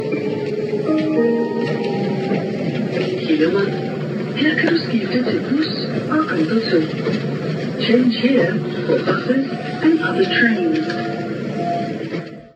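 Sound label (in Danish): s-tog hillerød; Hillerød. Her kan du skfite til busser og andre tog.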